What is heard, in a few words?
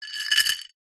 household necklace beaded set down in ceramic bowl or surface